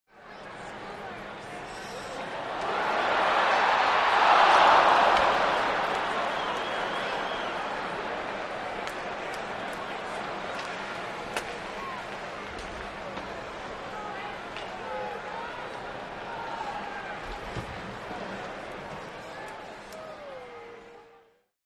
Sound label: Large Sports Crowd, reactions